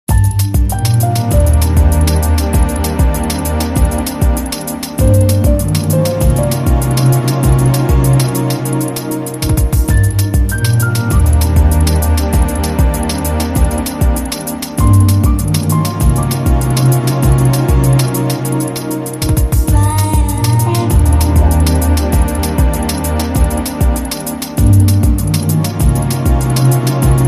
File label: Lift Music